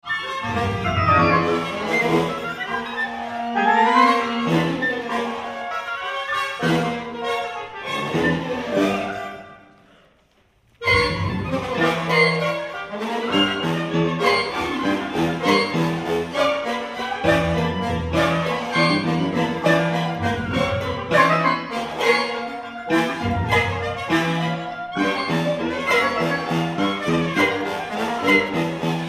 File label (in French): Pour Orchestre Fin